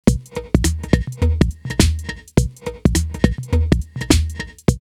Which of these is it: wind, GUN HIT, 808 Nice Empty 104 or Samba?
808 Nice Empty 104